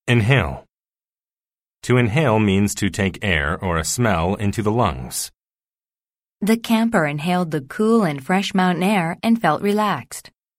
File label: inhale